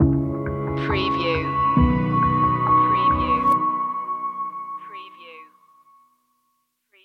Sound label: what is yet unknown stinger